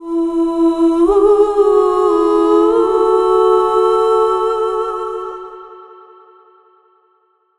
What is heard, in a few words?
Ethereal Vox; Sonic Foundry (Sony Creative Software); Continental Drift Aftershocks